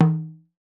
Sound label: NIGERIAN, African Perc